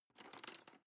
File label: cutlery teaspoon drawer grab soft